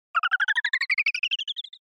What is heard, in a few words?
Motion Pop